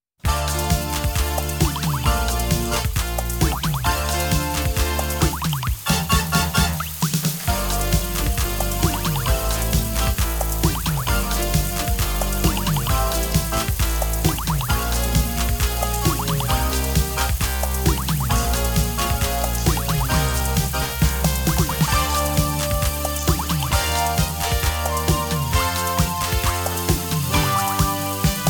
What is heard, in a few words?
Weatherpad Rain